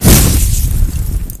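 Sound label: explosion; JetTank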